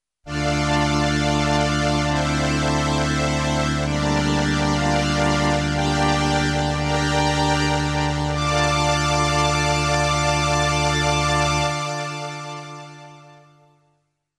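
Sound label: STRINGS I II with ensemble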